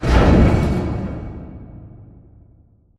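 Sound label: Prop Rack Start Distant; Prop Warehouse; Titanfall 2; Boomtown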